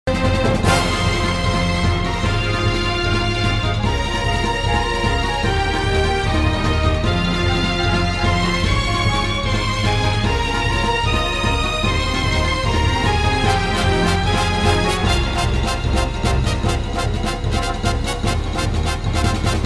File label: Orchestral